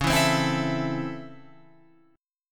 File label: Db Minor Major 7th Sharp 5th Db down Guitar Open F; DbmM7#5 strummed; JGuitar